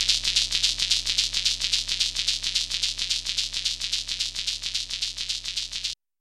Long Shaking; molecules-and-light

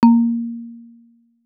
Marimba Classic; gravity-force-lab-basics

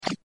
Edge Boundary Short Organic Noise; phet io wrapper sonification; gravity force lab basics

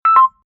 proton Drop, sonification; build-an-atom

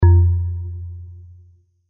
low marimba; phet io wrapper sonification; molarity

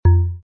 low marimba shortened; phet io wrapper sonification; molarity

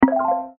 screen selection home; phet io wrapper sonification

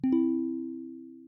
balloon Pickup; balloons-and-static-electricity

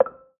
Check Box Select

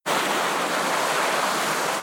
Carpet longer; john-travoltage

